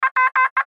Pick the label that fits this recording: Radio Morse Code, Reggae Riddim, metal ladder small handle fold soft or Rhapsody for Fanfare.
Radio Morse Code